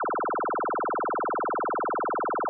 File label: Laser Machine Gun